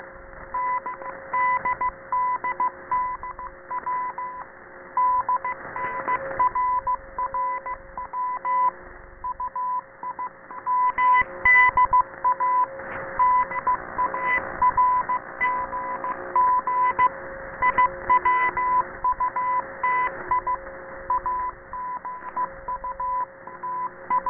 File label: rtty 2000Hz